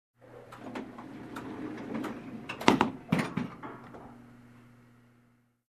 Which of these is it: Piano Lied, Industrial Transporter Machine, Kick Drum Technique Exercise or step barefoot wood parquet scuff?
Industrial Transporter Machine